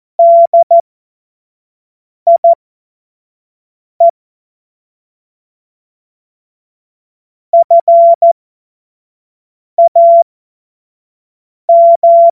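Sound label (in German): Witz, Morsekurs, cw morsen; Witze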